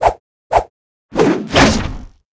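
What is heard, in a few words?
Attack Sword; Seer; GamigoNA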